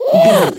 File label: goblin fairy growl frustrated